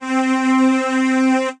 Strings Hi Ensemble, String Machines, Roland RS-09; Ableton; Factory Packs; Retro Synths